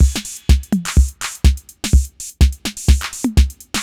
drum sixty full 125, Drum Loops, Classic Chicago House